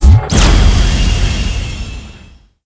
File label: Laser; Perorozilla; blue archive